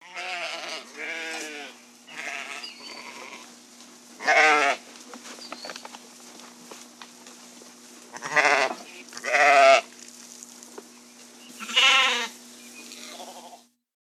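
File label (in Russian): овцы в стойле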